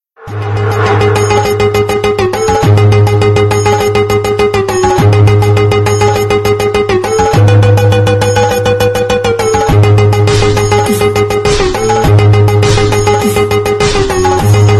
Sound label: bgm ringtone; michael